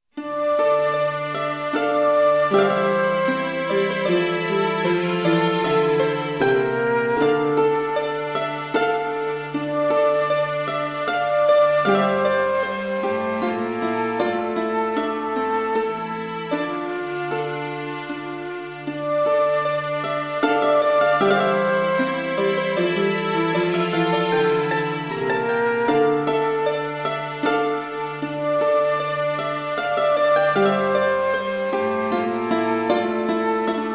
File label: Lament for flute